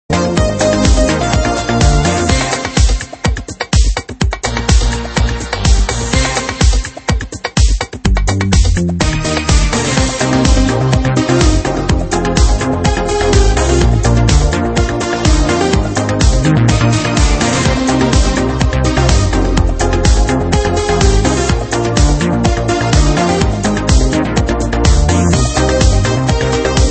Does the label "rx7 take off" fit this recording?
No